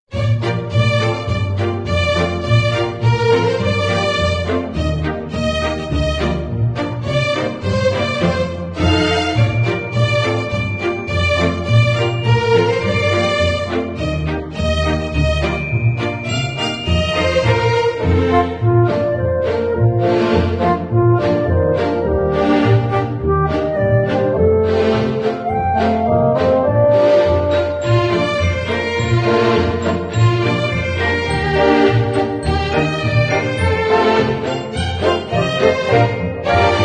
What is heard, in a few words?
Jingle Bell Orchestra Arrange; AdobeStock; Adventskalender 2020; Der Varta-Führer